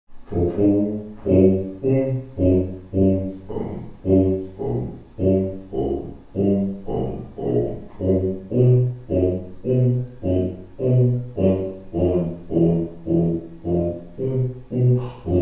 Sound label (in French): basse sans django